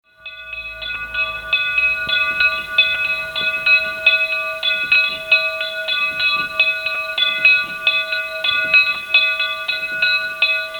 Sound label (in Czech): zvonek; cenkov